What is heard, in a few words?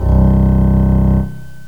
Contrabass, Strings & Orchestra; GMPlay